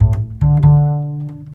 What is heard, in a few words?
BASS, 150 BPM; Ueberschall; Drum & Bass Flash Trackz